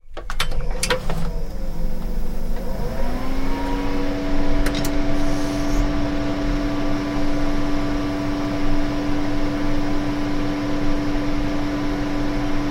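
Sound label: sounds of computer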